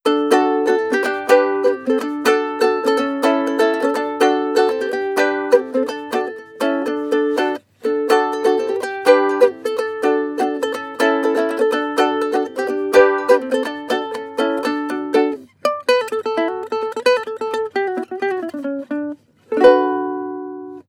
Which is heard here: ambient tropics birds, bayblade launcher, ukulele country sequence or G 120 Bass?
ukulele country sequence